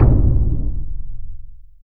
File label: WAR DRUM, FILM PERC; Drums In Your FACE!